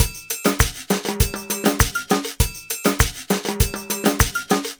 Ensemble, Latin Percussion

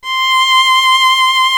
VIOLIN C6, SOLO STRINGS; Greytsounds VOL-1

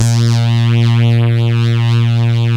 Saw Lead A#2; SYN JD 2; Best Service Gigapack 1

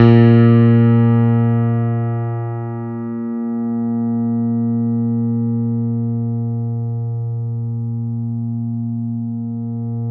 A#2, Piano, cp80

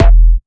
Kick E

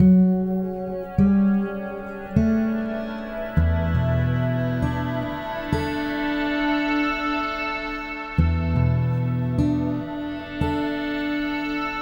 Guitar And Synth; Sonic Foundry (Sony Creative Software) - Friendly Fire

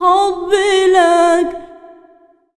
SINGER, ArabianTraditions

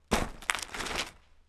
Rocky Step, outdoor, Footsteps

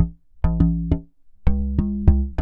DRUMLOOPS, Korg; Back In Time Records; Korg Universe VOL-1

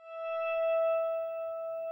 NYCKELHARPA E 2; SoundBytes - Early Patches

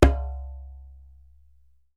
DJEMBE, African Perc

